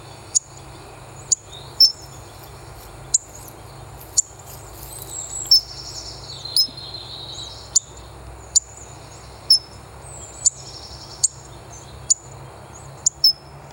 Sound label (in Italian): verso frosone coccothraustes hawfinch kernbeisser pepitero comun; bioacustica